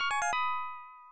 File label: Continue Jingle; Zero Wing; Sega; Megadrive-Genesis